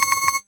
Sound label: countdown tick; Sfx; freepixel; Tilter